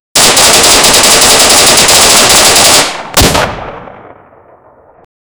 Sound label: gun